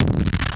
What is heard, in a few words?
DROPFIRE, Impacts, Hits & Booms; Distored Reality